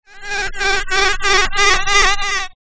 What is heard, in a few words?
science fiction robotic fly bee fly close; zapsplat